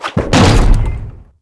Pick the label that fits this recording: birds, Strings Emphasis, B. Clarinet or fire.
fire